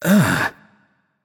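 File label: nonverbal ugh; voicepack; smite; merlin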